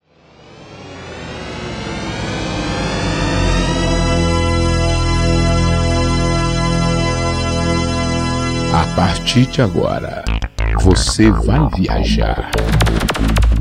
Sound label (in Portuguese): abertura